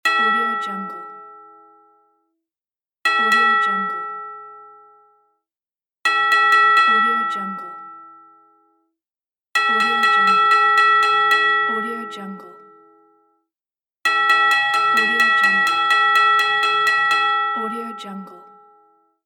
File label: boxing bell